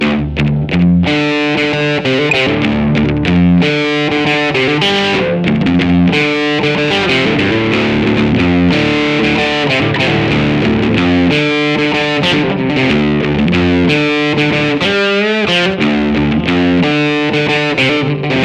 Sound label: Blues Loop Distort